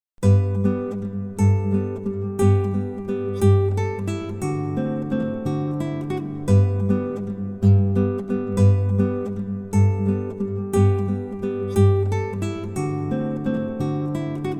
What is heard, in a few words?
Spring Waltz